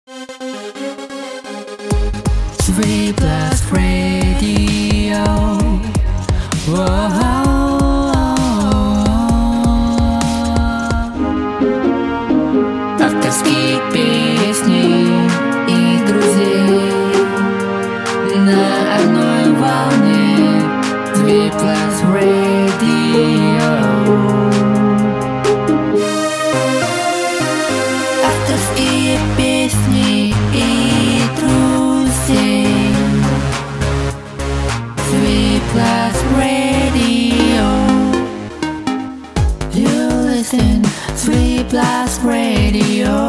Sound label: Radio Jingle pack